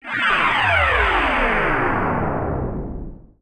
SPACE FX 140; SpaceSounds

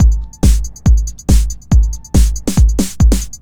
TECHNO BEATS 140

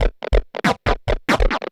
Industrial 140

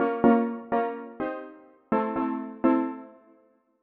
DEEPHOUSE ORGAN 125 A

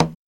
CONGA

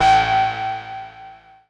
KEY SHOT TEKNO 140 A; Techno2; Singleshots

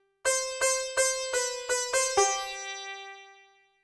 ethno, 125, A

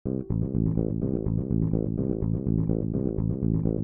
DEEPHOUSE BASS 125 A

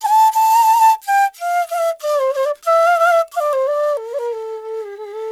ETHNO EXTRA 90; ethno2

